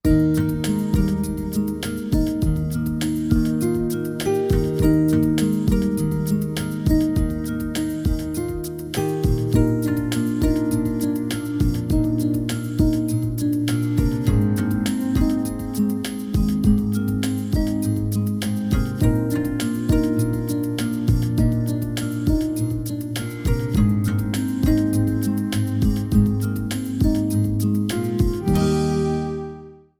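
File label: Sweet And Simple Life no acoustic guitar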